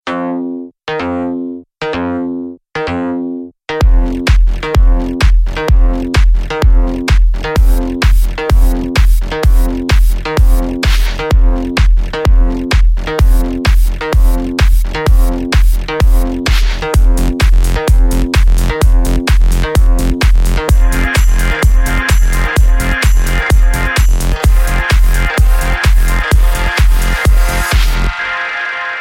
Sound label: Beats